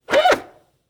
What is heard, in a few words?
servo bursts short whirs muffled